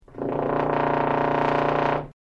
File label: BBb2 stereo, Trombone ContraBass